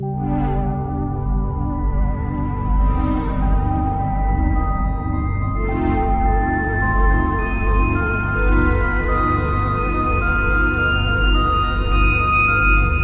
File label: Mines Ending music loop; stone story rpg